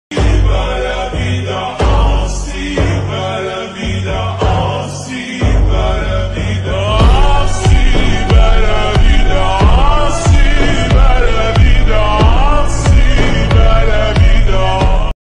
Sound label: Slowed Ringtone